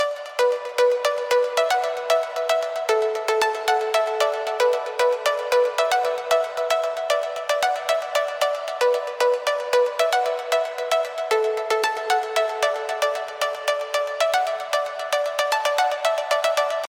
Pluck; Firestone

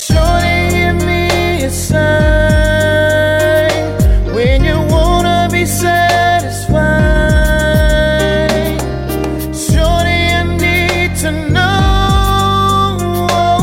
Ohh Ahh

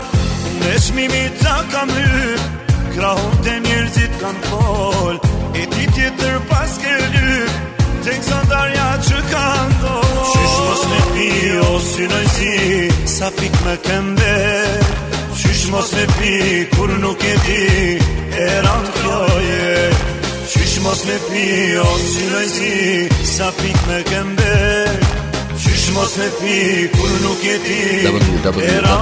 Albanian

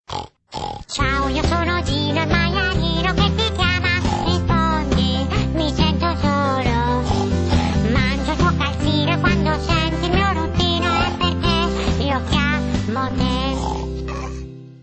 Little Pig

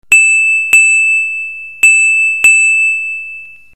Android 3 chimes

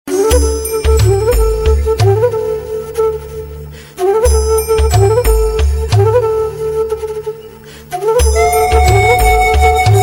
Bansuri

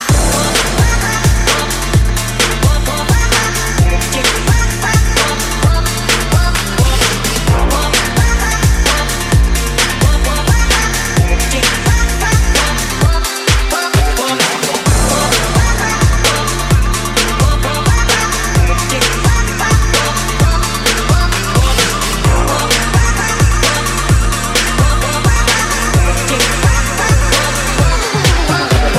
Drop N Bass

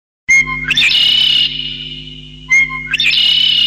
3d Bird

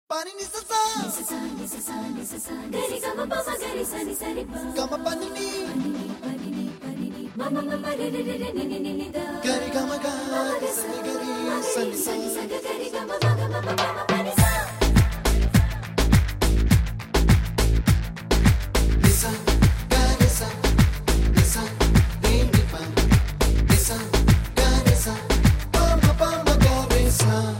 Indian Beats

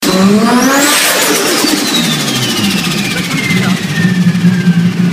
R34 Blow Off

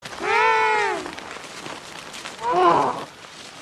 Penguins Wah

